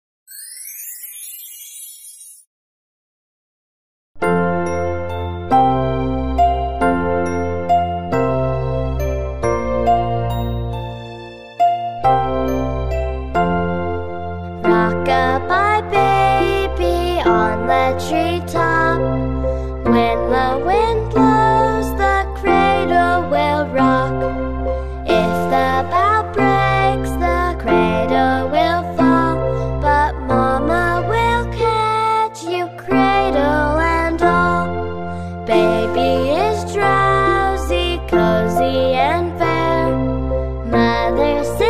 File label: lullaby